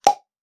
Pop

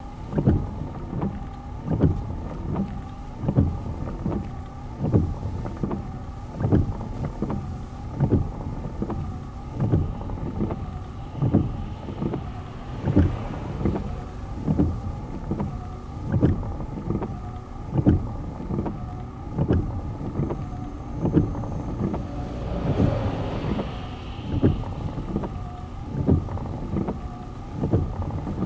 Wipers

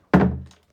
Trap Door Open

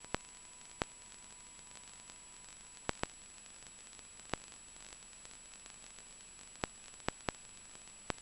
Static Poppy Light

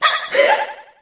Text alert

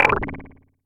Alien belch

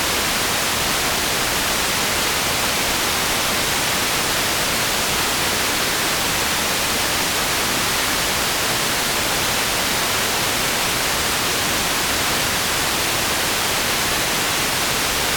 Constant Radio Static

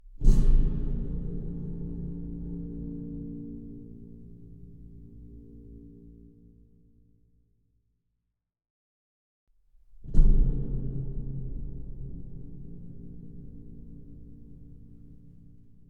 Scary Cinematic Gong Hits

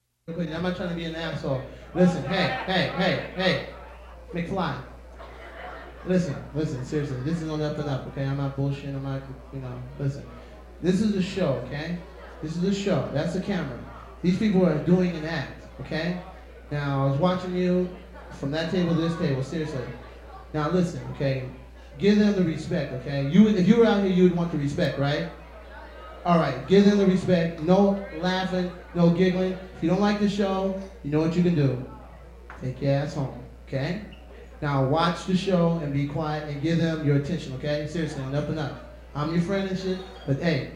Crowd Warmup

